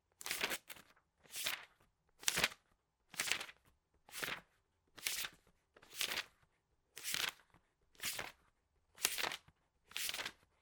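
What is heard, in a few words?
page turning sound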